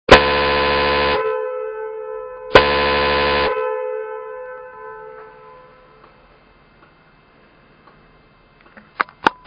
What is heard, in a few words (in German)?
KLANG, hupe